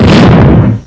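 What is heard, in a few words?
weapon explosion medium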